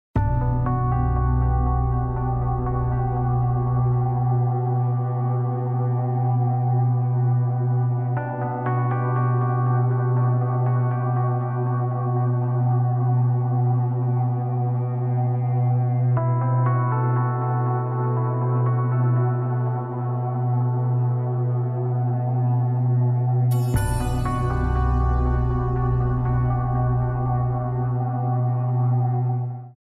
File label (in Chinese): 专题片背景音乐